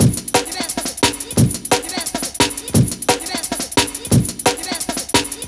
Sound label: 175, classic dnb breaks